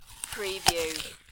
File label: Eating, Bite, Munch, Crunch, Person Bites Into Apple